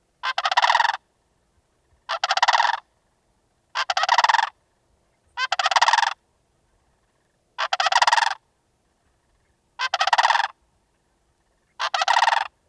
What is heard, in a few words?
sandhill crane guard calls, bird song